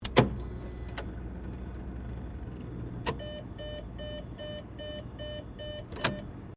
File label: ATM Card Remove, Automated teller machine; Machinery (Sound Effects:Machines)